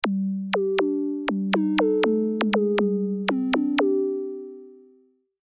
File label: Xpander, synthesizer